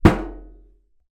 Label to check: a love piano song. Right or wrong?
Wrong